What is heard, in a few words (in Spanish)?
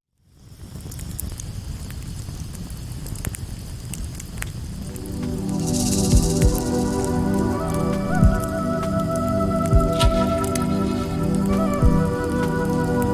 Fuego; sonoprogramacion